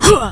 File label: jump; quake2; ladydeath1